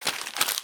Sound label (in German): Wegwerfen